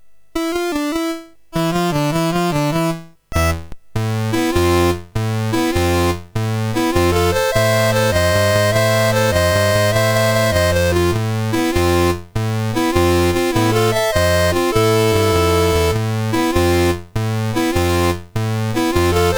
Pink Elephants, MOS6581

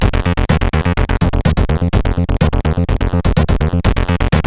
Intro, sid CSG8580R5

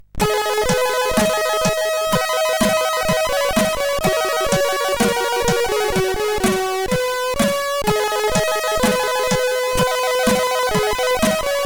music, sid CSG8580R5; Megahertz 01 music 3

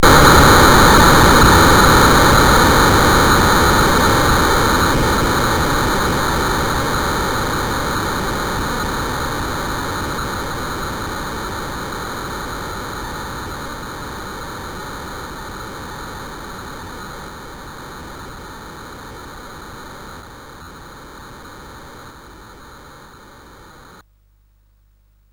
Frantic, CSG8580R5

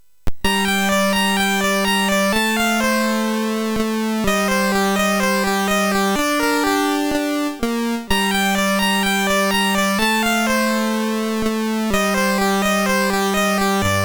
Rain, MOS6581R3; Banana; hvsc

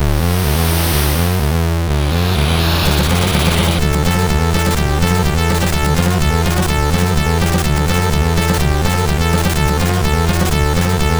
Shorty tune sid MOS6581R3

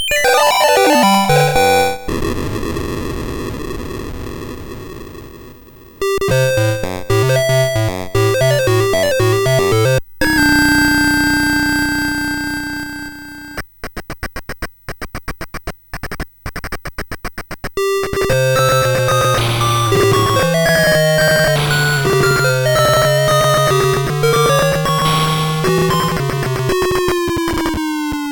Musicbox, sid MOS6581R4

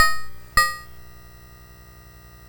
BASIC sid MOS6581R4, GAMES; Sword of Fargoal

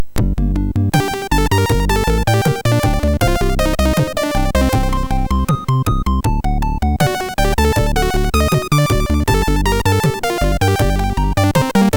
My Funky C64, MOS6581R4

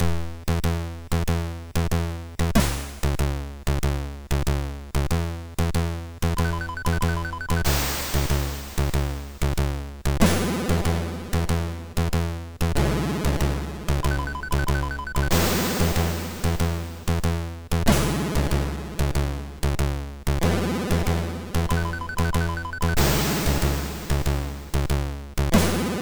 intro sid MOS6581R3; Balloon or Die

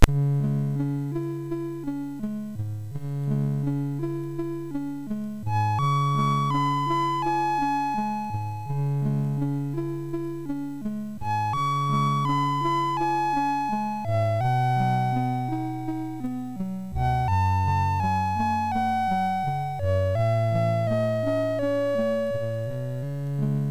MOS6581R3, GAMES; Snowman Mr Freeze

